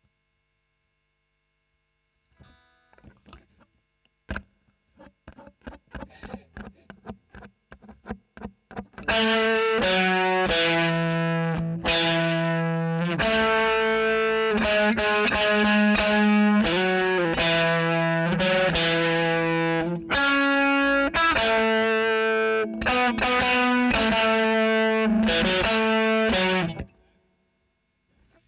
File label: E. GTR Royer OD